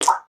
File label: slime